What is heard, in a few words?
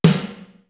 AMBIENT; East West - Global Sounds; D4 BD SD TOM